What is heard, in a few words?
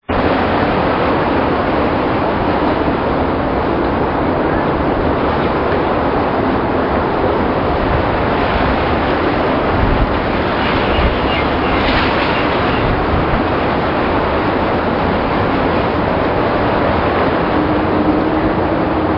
commercial site, noise; sources of noise; 2007 noise surveys